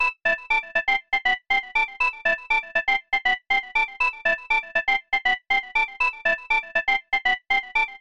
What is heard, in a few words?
Synth